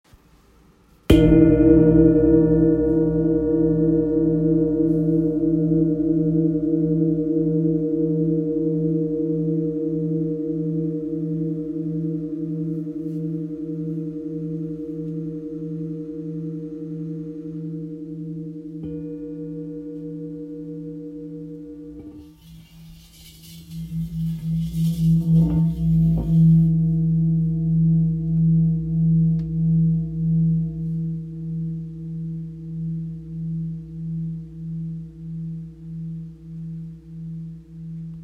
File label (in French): Ramgandi mi